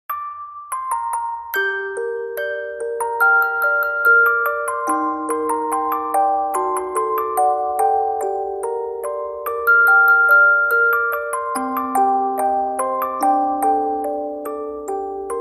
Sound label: music box; Limitless